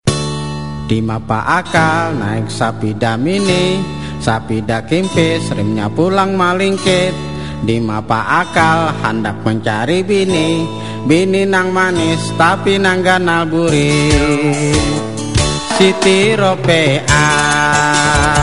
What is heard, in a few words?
banjar, ringtone; Banjar 1